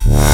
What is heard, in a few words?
Synth Shot; Vengeance Samples